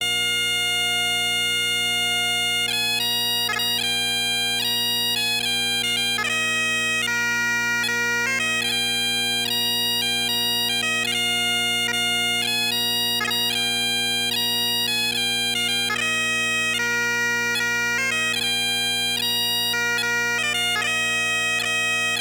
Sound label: Drones; Banks of the Lossie